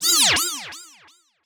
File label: Animation Electronic